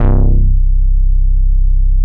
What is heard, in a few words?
DETUNE BASS, VINTAGE VOLTAGE